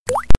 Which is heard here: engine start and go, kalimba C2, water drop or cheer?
water drop